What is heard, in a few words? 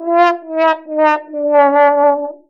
sad trombone